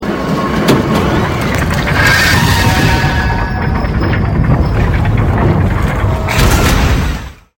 blast doors up

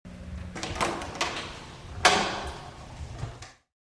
door opening hallway

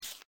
page turn

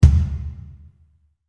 kick big amb

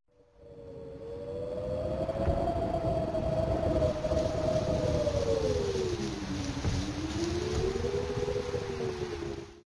wind rain

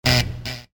Door Buzzer

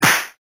whip

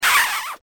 kiss

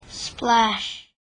splash

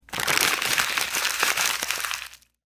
crumbling paper

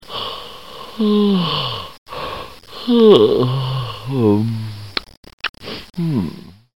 male yawn

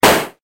Gun Shot

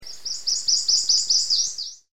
Prothonotary warbler

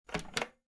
switch

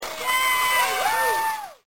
cheering

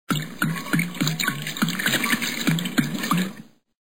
glug glug

